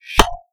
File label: plink